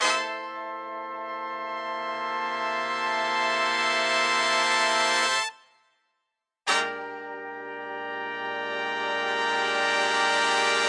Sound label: jazz horn swells; HORN LOOPS